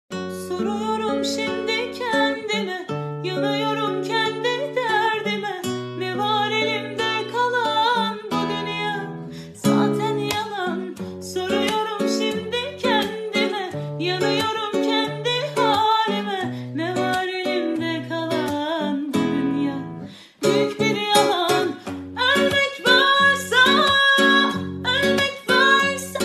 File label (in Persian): torki ghamgin ba gitar